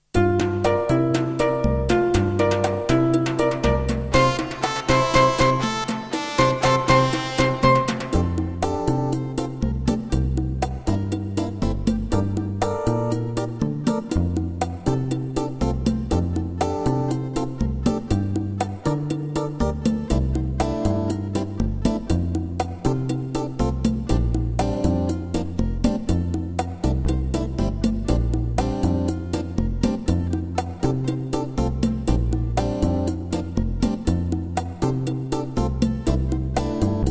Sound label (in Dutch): websint karaoke